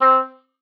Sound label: C4, OBOE; Xsample VOL-6